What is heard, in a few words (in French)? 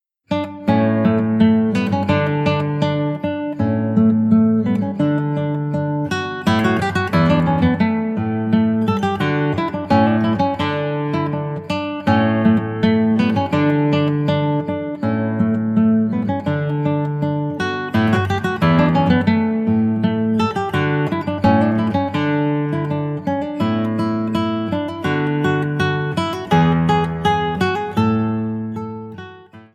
danse anglaise